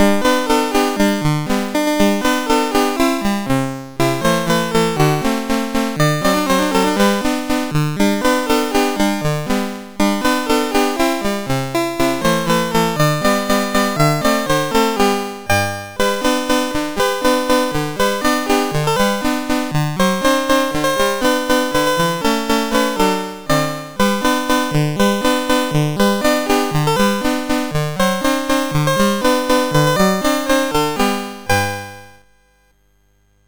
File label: Jingle Bells, sid MOS6581R3